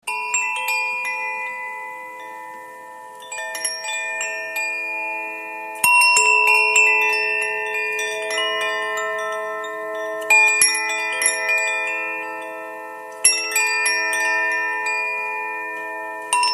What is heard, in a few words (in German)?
Koshi Luft